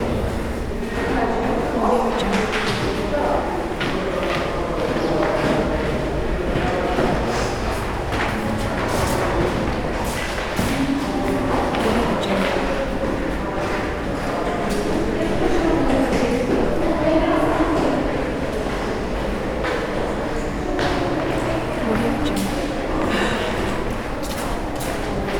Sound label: conference hall